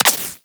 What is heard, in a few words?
Laser Impact Flesh; Warframe